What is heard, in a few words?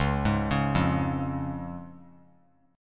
C sharp Major; 13,15,16,15; arpeggiated; JGuitar